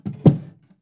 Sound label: Coffin Wood Box Movement